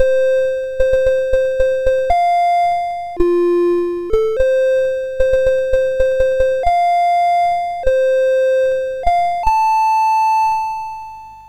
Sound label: MOS6581R4, sid; Nibbler; hvsc